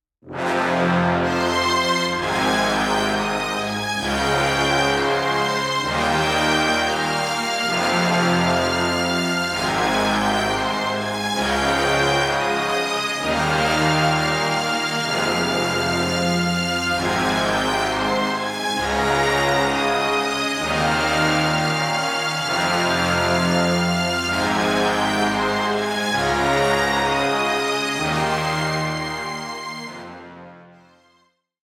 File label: orch buss solo